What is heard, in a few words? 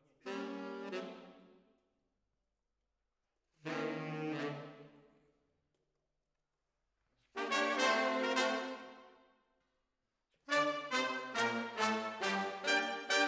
Decca, Jazz for piano